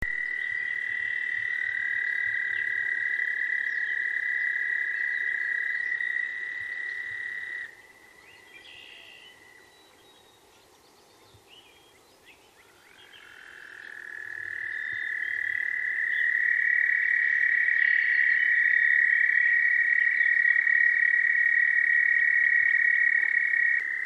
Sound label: toads